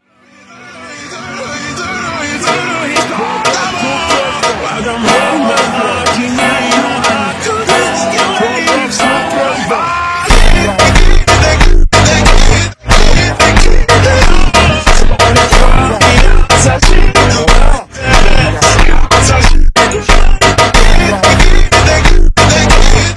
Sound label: FUNK Ultra Slowed